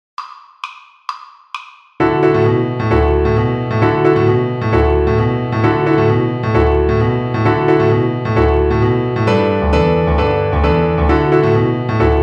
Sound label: String Gym, Piano Accompaniments